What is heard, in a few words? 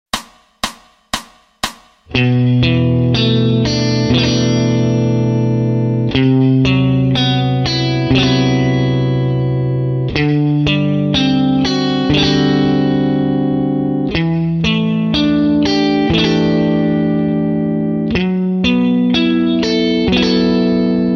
D Mixo Harm In 4ths (5th Str)